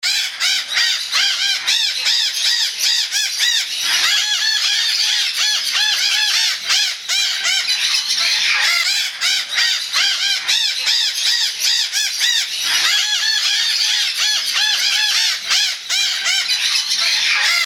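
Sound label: Hahns Macaw, parrot calls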